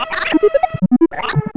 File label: boss mini bugs; Gnat Attack; Super Mario Paint